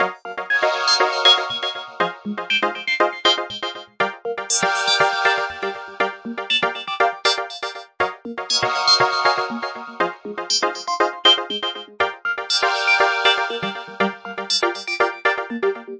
twilight star vibe synth, 120; SYNTH AND ELECTRONIC LOOPS

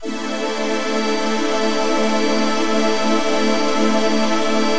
lightgrid synth chord

